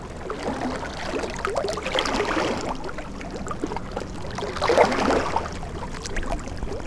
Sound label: ocean